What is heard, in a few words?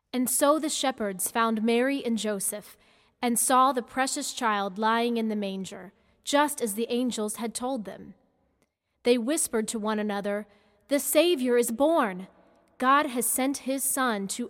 satb